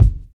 Kick, Kickdrum; Equipped Music; Loungin House

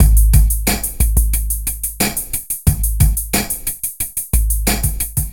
Noise Beat; Drums & Drones

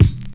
Lo-Fi Compressed Kick

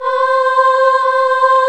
choir

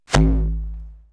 Flup, perc fx; X-Static Goldmine

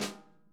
SNARE, Interactive Drum Kits; Sonic Reality

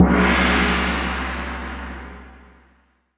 Asian Gong; Cymbals

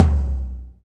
TOM TOM, Roland

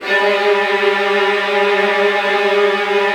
G1, Strings, STR HLF TRLL; ILIO; Kirk Hunter Virtuoso Strings VOL-3; 90 s Sample CDs

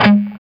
SOLDANO ROCK, Guitars

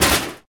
Piano Version; Otogirisou

